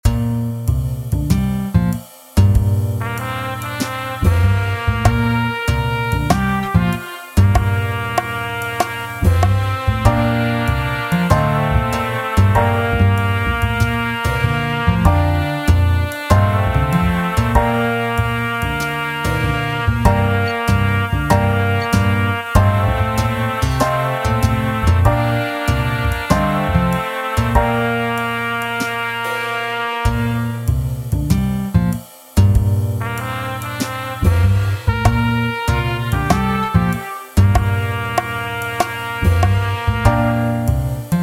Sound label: Hot Cross Buns Practice Tempo B Flat